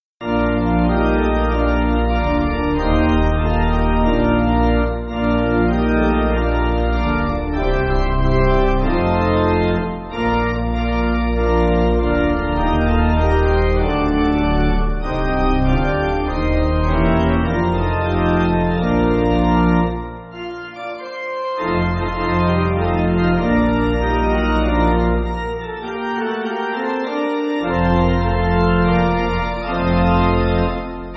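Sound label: Pipe Organ, church backing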